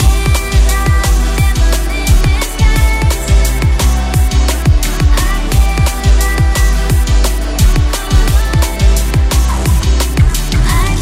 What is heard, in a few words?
DNB